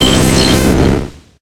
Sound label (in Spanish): Grito de Tentacruel